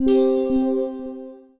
PUZZLE Success Bell Voice Three Note Climb Wet; stereo; Universal Sound FX

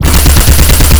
Assault Rifle Shot Loop; SFX Kit; Weapons

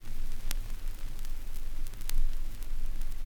CRCK, Production Effects

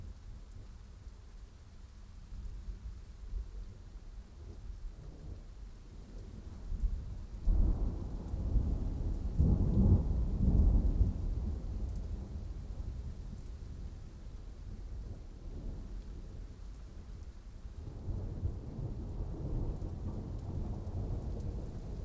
Thunderstorm dry extended; boom library; Thunder And Rain

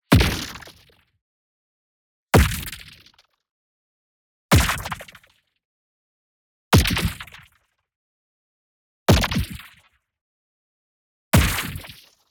IMPACT MEDIUM Ice Particles; boom library; Matter

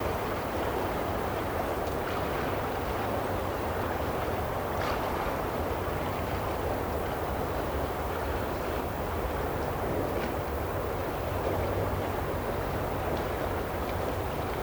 Sea Outside

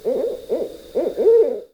Owls Hooting; Inquisitor 3

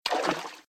Footsteps - Water - Ankle Level; RadwinIsland

